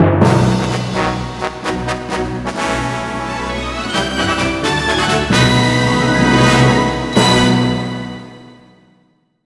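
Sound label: Jingle Map Clear Fanfare; A-Train City Simulator; A-Ressha de Ikou 3D; 3DS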